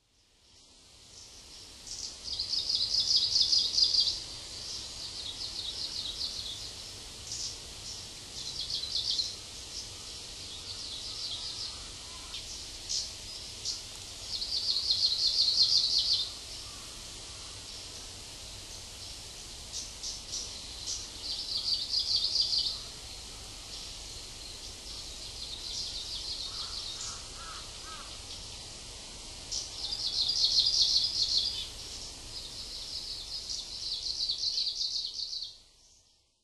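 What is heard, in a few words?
Kamchatka Leaf Warbler, sapporo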